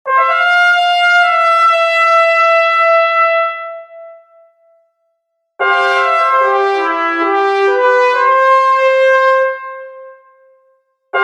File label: intro music trombones sustain; black box